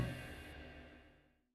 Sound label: Loop Tail; Good Job